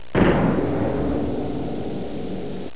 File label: cannon